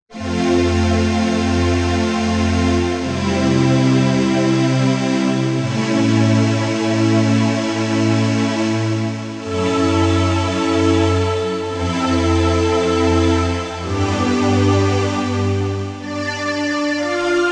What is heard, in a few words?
Vintage Choir